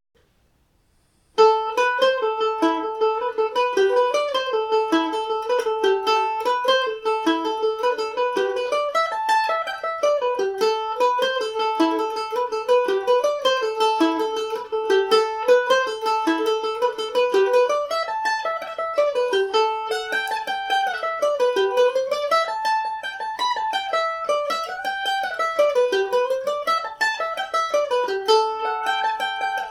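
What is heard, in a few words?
A dorian, Irish mandolin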